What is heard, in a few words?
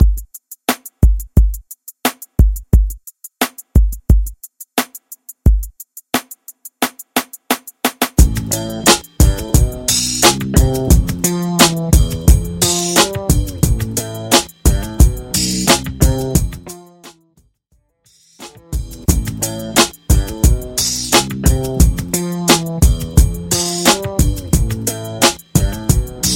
90s RB Club Re Drum 88 Bpm